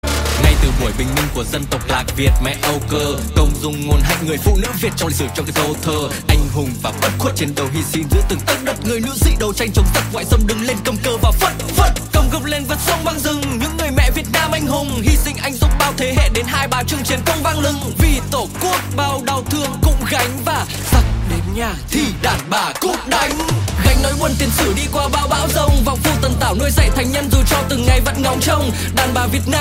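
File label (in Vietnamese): nhạc chuông, đoạn rap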